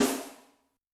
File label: Power pic, Yamaha DTXpress-II snare